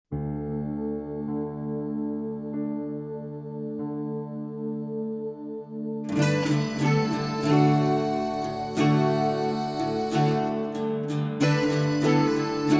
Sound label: Hymn For Our Fathers, acoustic studio